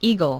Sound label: eagle